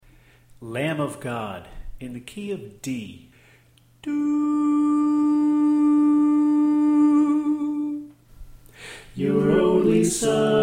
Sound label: Soprano Left